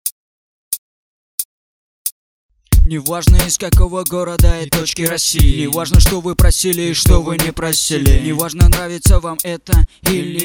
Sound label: Trance